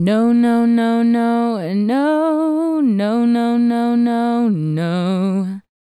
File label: Female Solo, Hip-Hop and RnB Vocals